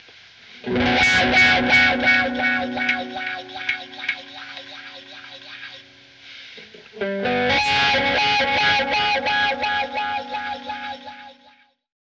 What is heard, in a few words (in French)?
guitare wah wah